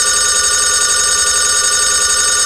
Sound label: telephone; GMTones